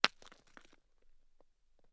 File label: bounce